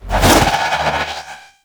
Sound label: Melee Spin; Warframe; Sentients; Sentient Trooper